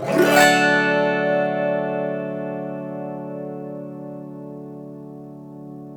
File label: AUTO HARP